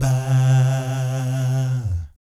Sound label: VIB FX, Vocal Planet Jazz & FX; ILIO; JAZZCOMBO